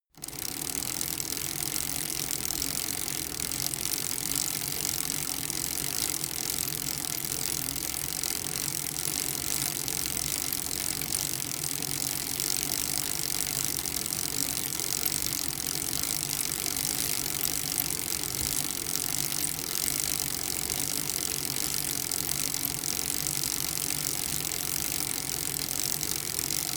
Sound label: bicycle rickety freewheel